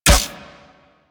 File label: Mech C; Warframe; Weapons; Infested; Inf Cernos; Prime Derelict Cernos